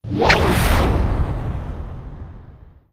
fan woosh

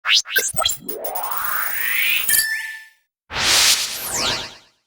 startup

